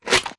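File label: empty reload magazine insert; car; Titanfall 2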